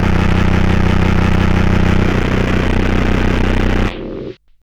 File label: CARTRASH; USB Soundscan vol.01; Hard & Loud Techno; AKAI